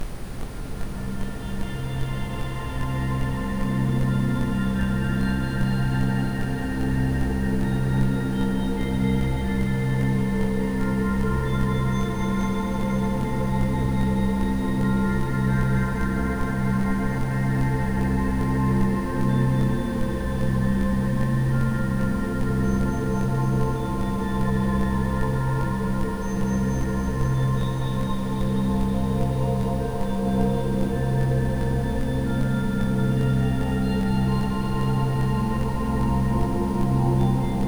Rife Schlummer Serenade mit Ambient Sound; rife frequenzen

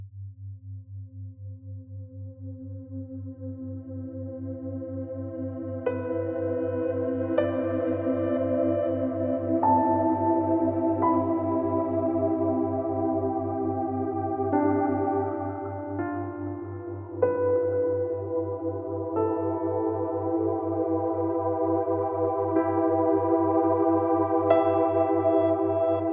Theta BB 4Hz mit Ambient Sound; Theta Zen; binaural

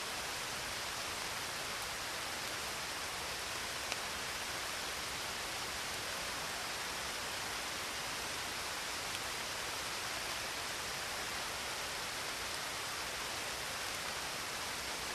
Natur Klangwelten Regen und Gewitter in den Alpen; naturgeraeusche; Silentsubs

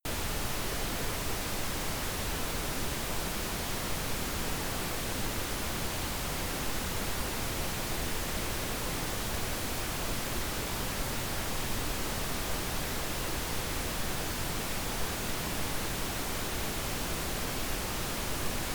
Rauschwelten Rosa Rauschen; noise; Silentsubs

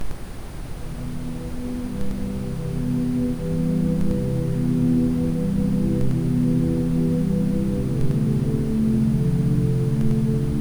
Rife Traumland Treiben mit Ambient Sound; rife frequenzen

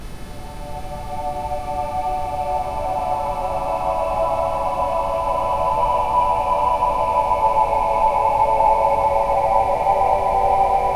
Rife Geist Schmelze mit Ambient Sound; rife frequenzen

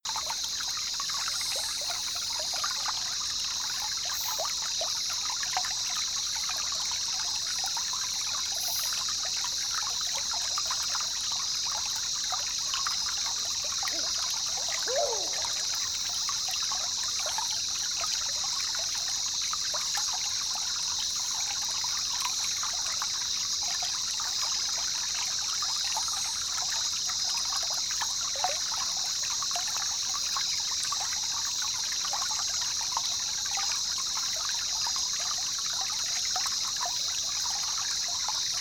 Natur Klangwelten Ruhiger Fluss im Dschungel; naturgeraeusche; Silentsubs

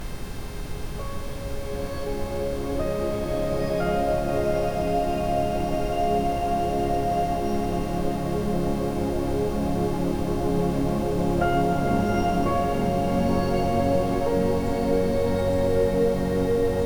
Rife Sanfte Wellen mit Ambient Sound; rife frequenzen

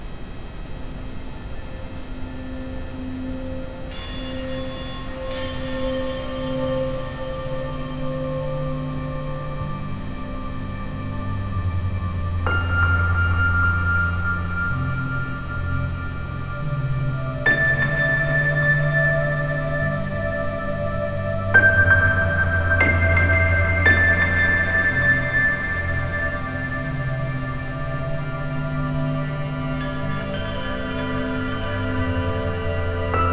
Rife Harmonie Umarmung mit Ambient Sound; rife frequenzen

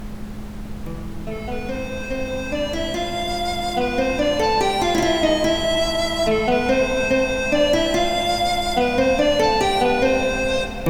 Ambient Sound; chakren; Svadhisthana Kreativitaet

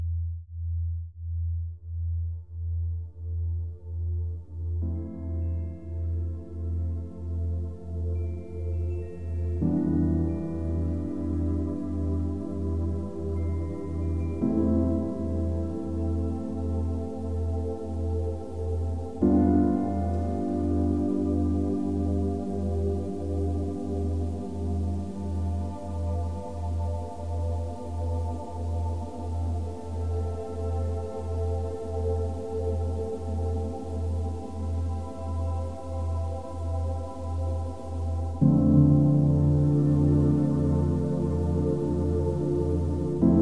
BB 1.5Hz mit Ambient Sound, binaural; Delta; Tiefenentspannung

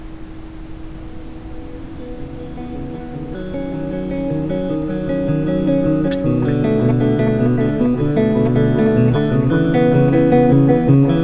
mit Ambient Sound; chakren; Manipura Ruhe

